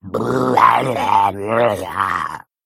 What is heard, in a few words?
male murloc play